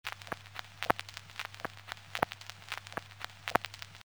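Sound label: scratch; device sounds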